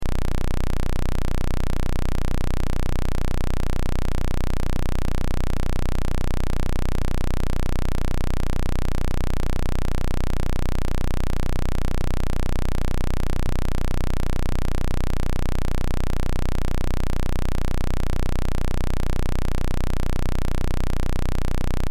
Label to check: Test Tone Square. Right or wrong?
Right